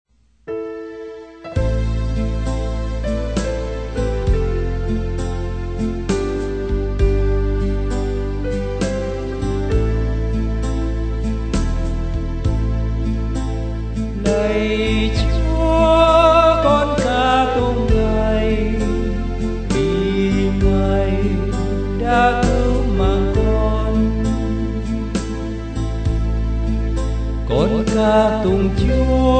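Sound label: soprano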